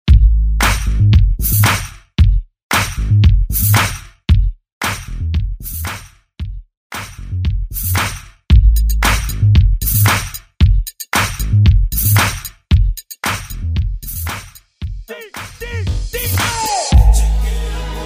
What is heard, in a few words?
Intro Break Remastering 114Bpm